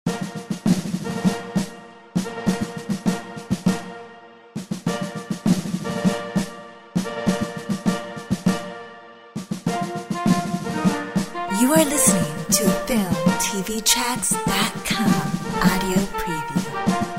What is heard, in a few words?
The battle orch